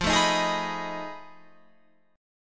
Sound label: F 7th Sharp 9th Flat 5th, Guitar, Open F; F7#9b5 strummed